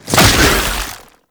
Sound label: Underground Attack Tentacle Emerge; Enemies; Abilities; Warframe; Proto Infested Babau